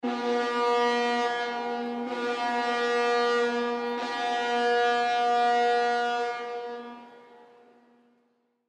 Horn Cruise Ship Multiple; Transportation Watercraft

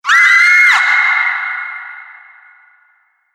Distant Banshee Scream, Monster Sound Effects

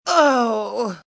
Pain Oooh, Pain, agony, female; VoiceBox

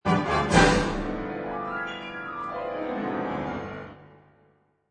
Orch Hit n Run; Orchestra crescendos to a hit following by quick piano runs

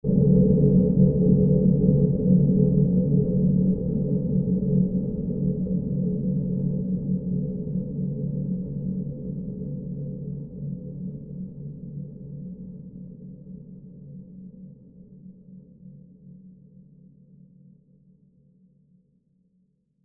Horror Dark Element Burst Ethereal Deep Textural; Scary Tones; Blastwave

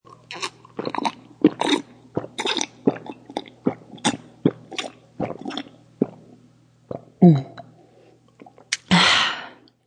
Female Drinking; Drinking sound; Sound Effects:Human

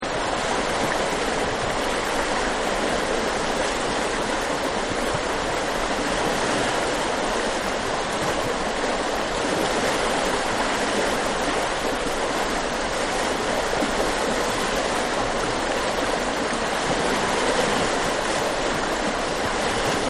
Spring Water; Lakes And Ponds; Partners In Rhyme

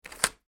Foley Deadbolt Lock Door; Locks

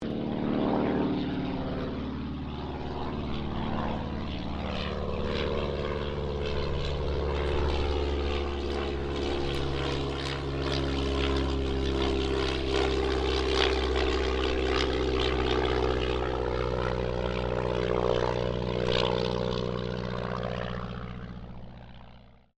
AIRCRAFT DH82A DEHAVILAND TIGERMOTH PASS

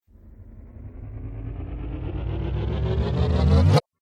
Dance Riser; Rise And Dive Sound Effects

